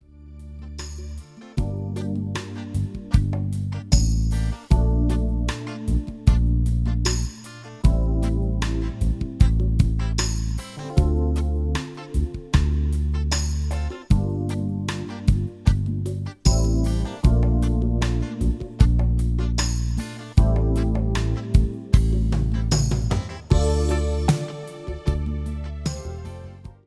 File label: fragment, altosax